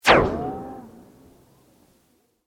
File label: Sweep Fast; Created sweeps, swooshes and other movement effects; Sweepers; Sound Design; Foundation4